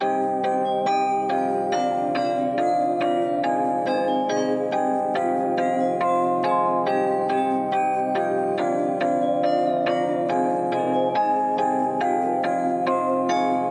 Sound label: 140 BPM C Min, Trap Melodies; Benz; Cymatics; Trap Starter Pack